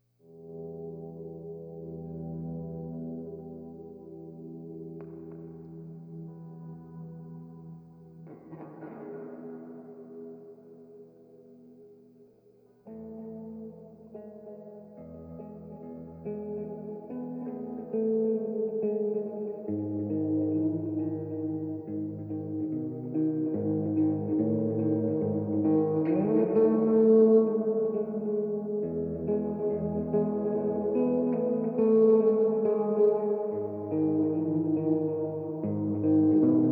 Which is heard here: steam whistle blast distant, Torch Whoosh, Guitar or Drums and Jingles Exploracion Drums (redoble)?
Guitar